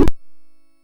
MOS6581R2, sid; Future Knight

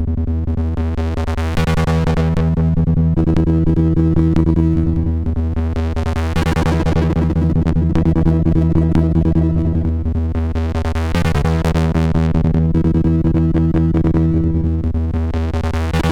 Loop, MOS6581R4

